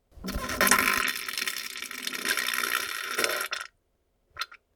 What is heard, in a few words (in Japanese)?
ぶぶりゅりゅりゅりゅぅぅぅ, ふぇち音「下痢の音」